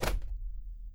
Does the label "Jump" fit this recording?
Yes